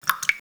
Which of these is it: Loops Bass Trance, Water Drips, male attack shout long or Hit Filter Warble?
Water Drips